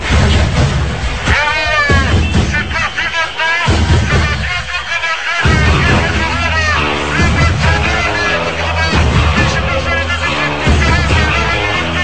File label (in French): Chanson de foot